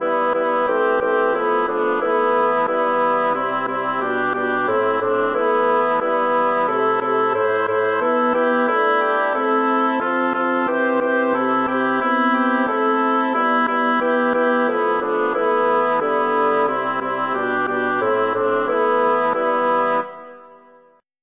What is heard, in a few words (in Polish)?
wieloglos msza kyrie